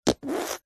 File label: human hands wet squeeze together fart noise